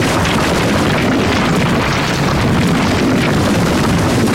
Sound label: Quake Tunnel Loop; Destruction